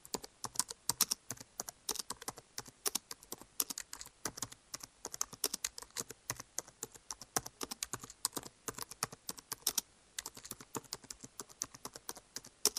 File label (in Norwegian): Tastaturlyd; Kampen om Huldreskogen